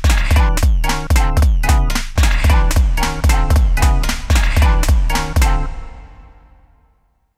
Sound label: Digiverb; Behringer Space FX; Korg Volca Sample2